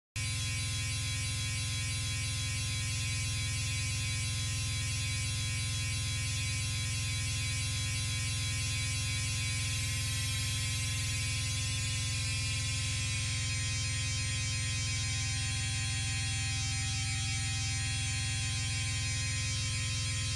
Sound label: NOISE